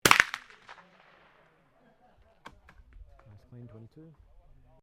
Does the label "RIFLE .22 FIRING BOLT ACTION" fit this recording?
Yes